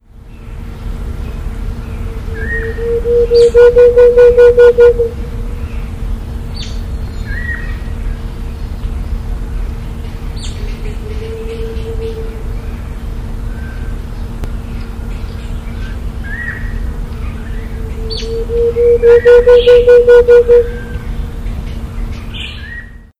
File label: Grallaria varia; Cantareira S Park; Brazil